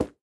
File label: block wood place